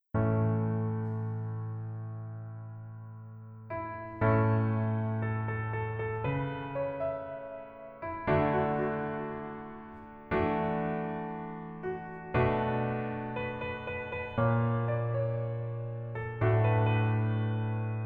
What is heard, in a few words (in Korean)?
Piano ver 멜로디 MR -1 키